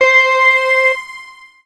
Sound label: Phantasm C6, synths; Multisamples; Ableton Live 12 Lite; Core Library